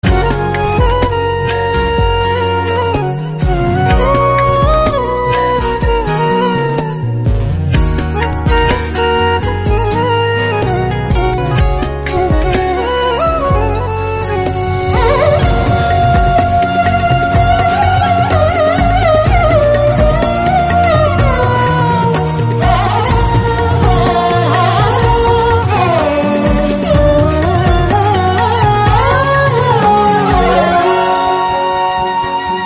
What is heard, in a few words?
flute